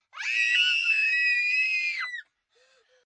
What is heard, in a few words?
Group Shrieks, Scream, Group, 2-3 children, high-pitched, short, distant, kids; males, females, girls, boys, human voice